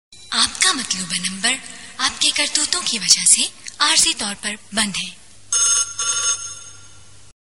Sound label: New Vocal